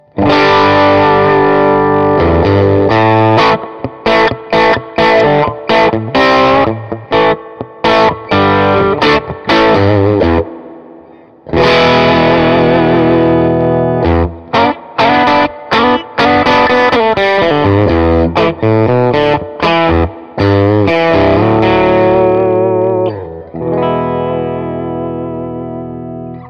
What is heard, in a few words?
VR Pedal OD, vibrolux reverb 1 kanal; Pedal / Overdrive; NA-VR763-1 Vibrolux Reverb; Tillverkare: Nordström Audio